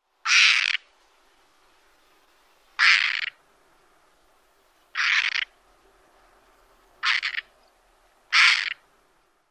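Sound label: white-tailed kite grating calls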